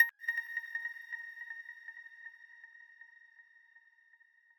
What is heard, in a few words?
arp